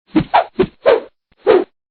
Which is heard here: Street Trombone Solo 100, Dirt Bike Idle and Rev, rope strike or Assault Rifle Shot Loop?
rope strike